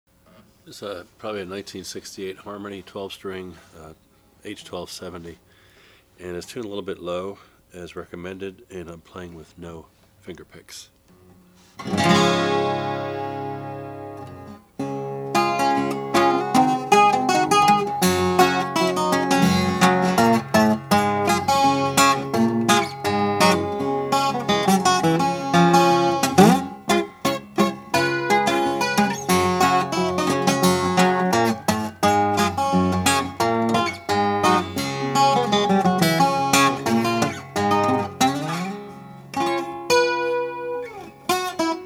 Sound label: harmony h1270 natural